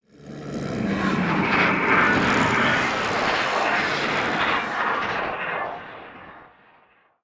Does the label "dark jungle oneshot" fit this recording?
Yes